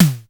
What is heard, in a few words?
electronic snare; hr16